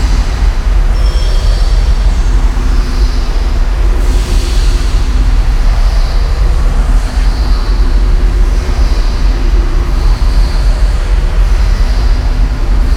Silo Amb Loop

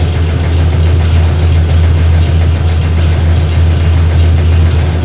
Nexus Book Machine Loop

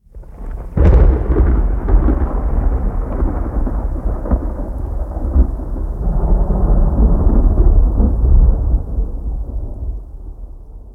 Distant Strike; moul

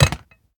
Maint On Glass Walk

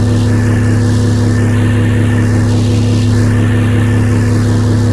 Imager Small; Mirtahm; moul-assets

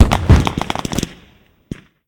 Fireworks Explode

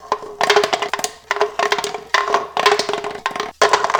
Small Bones Roll

